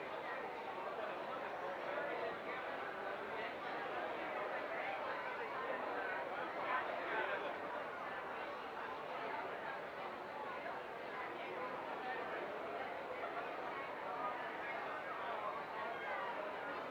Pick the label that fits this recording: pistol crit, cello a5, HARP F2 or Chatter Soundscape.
Chatter Soundscape